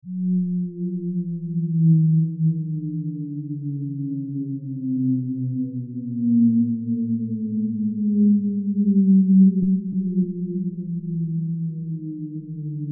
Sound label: Shepard Tone